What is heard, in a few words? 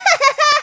haha; toad; sm64coopdx